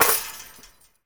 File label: Street Ball Chain Swish; SFX Kit